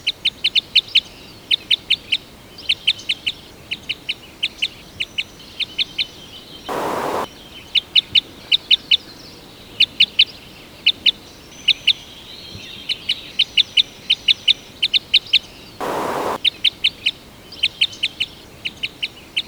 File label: Cassia Crossbill Contact Call